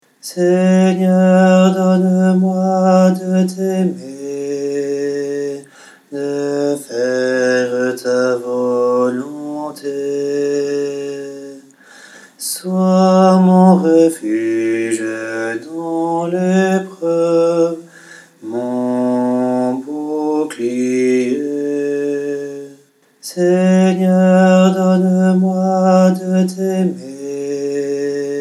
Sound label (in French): seigneur mon bouclier basse, choeur d'hommes